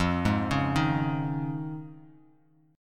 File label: F Minor 7th Sharp 5th, Bass Standard; Fm7#5 arpeggiated; 13,11,11,8